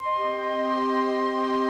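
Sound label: flute strings; Greytsounds; F2 SOUNDTRAX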